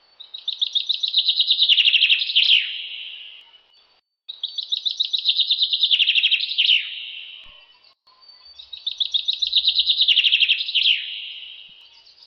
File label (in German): Buchfink